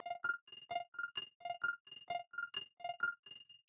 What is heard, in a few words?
piano vol